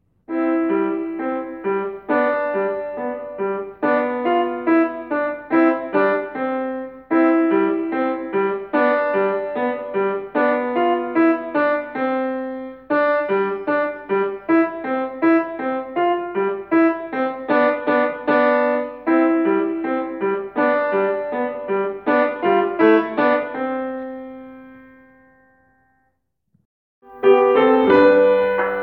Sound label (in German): Mein klines Pony, Old Dutch Dance